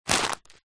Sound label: food porridge oats packet pick up grab